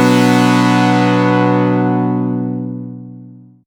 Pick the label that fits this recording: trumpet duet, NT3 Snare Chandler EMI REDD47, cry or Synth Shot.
Synth Shot